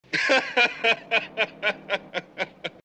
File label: laughter